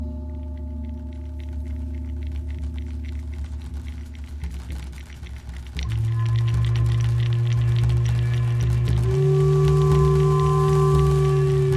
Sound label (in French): musique chamanique tambour